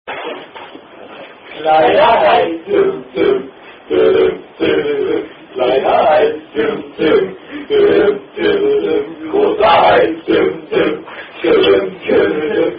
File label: German Attack Song; Rheinhausen Kleiner Hai